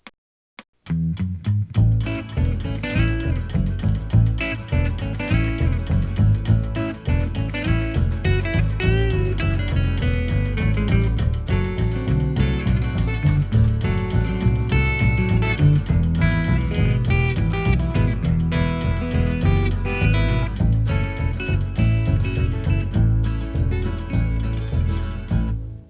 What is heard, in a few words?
solo